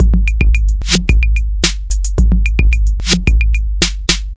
110 bpm, 808 revenge and reverse, drum loops and breaks